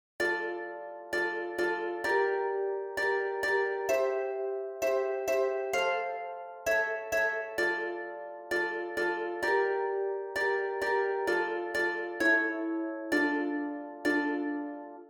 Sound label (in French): HARPE